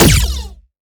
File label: Shot Small Laser Supercharge Phaser